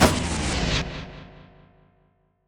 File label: buffed off; tf2classic